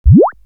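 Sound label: large bubble, cartoon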